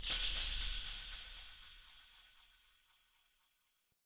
Animote Idle Shake; Animal Motes

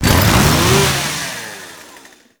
Chain Saw Tonfa Accent Rev; Warframe; Grineer

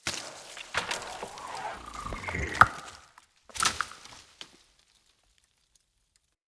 Tree Growth Acorn Fall; Cinematics; Bromius

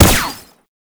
gunbrella bullet deflect; Weapons; Warframe; Tenno; Koumei

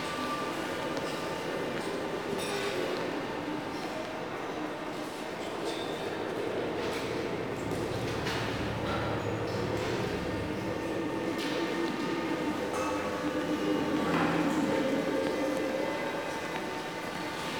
Locker Room Ambient Loop; Warframe; Venus Open World; Venus Solaris United; Exterior

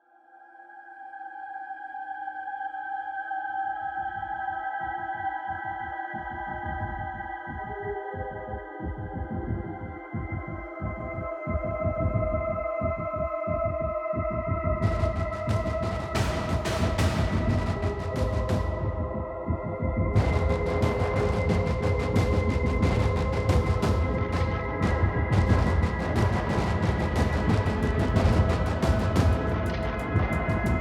Login Music; Login Screen; Empyrean

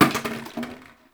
Janitor Plastic Bottle Water Drop; Ambience; Gameplay; Warframe; Nineteen Ninety Nine

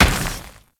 Moa Combined Step Dirt, Footsteps; Enemies; Warframe

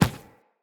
Infested Medium Run Default, Footsteps

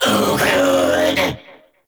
summon vocal; Warframe; Uriel; Vythelas

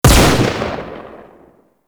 Heavy Pistol Shot A; Warframe; Weapons; Zariman Heavy Pistol

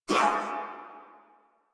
Pain Crewmen, Battle Barks; Warframe; Corpus Crewmen; Crewman C

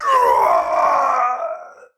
Death, Battle; en; Tau; Tau Dax Knight

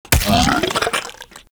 Tendrils Digest; Warframe; Infestation

